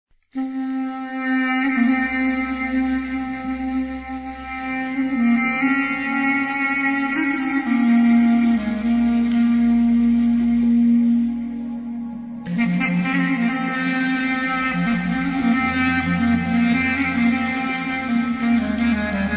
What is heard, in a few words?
MUGAM; Turkmenistan; traditional mugams